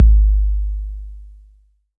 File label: BD 808 Tape Decay